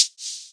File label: OH, hats